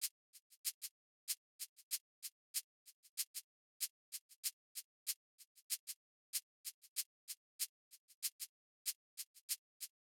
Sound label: Drum Loop - 95 BPM Shaker, Afrobeats; Loop Stems; Cymatics; GHOST (Beta Pack); Cruise Drum Loop